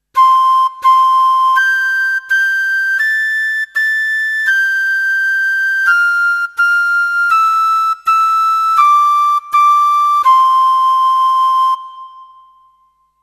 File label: piccolo